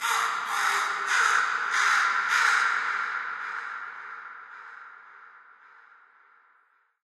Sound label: amb crow; video games; ambient; nature; source engine; portal2